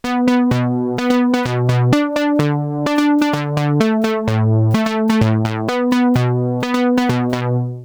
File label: lead seq; OSCar